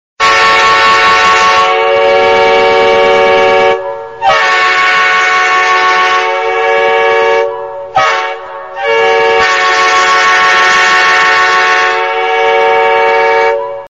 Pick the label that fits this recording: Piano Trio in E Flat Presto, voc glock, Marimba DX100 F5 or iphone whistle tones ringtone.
iphone whistle tones ringtone